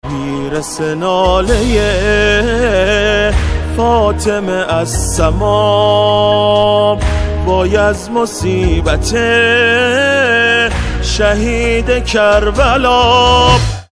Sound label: ringtone